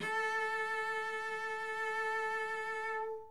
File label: cello